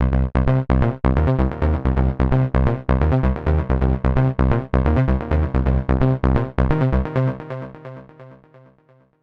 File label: Bassloop 130bpm Bflat Low